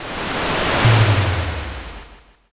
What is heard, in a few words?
fire magic